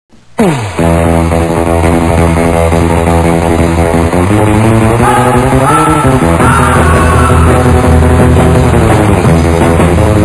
tune; quake2; chaos; sin

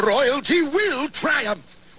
death; quake2; inferno

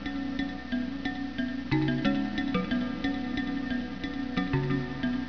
beat; quake2; chaos

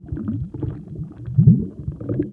underwater; quake2

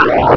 pain; quake2; ontra

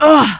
fall; quake2

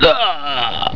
death; quake2; postal

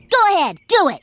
pain; quake2; bart

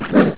gurp; quake2; citrusfrog

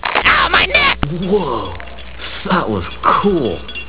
fall; quake2; bandb

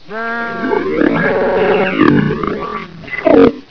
death; quake2; ranger

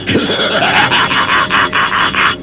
pain; quake2; megatron

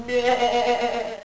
death; quake2; sheep

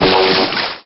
gurp; quake2; droid

